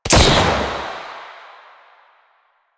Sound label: Epitaph Prime Shot C, Weapons Sidearm; Warframe; Tenno; Wraith Sidearm